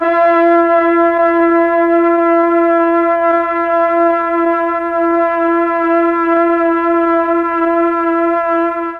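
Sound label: E4 half speed brass, mellotron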